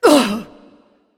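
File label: grunt hit, female; smite; voicepack; hera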